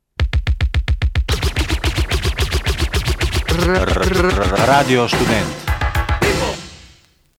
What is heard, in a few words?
DRUMS